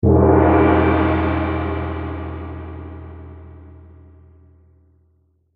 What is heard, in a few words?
Gong; conan xbox 360 gamerip 2007